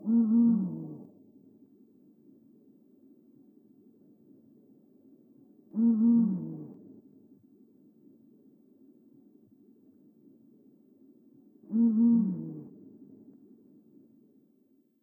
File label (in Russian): penie, ketupa blakistoni; animals; vertebrata; aves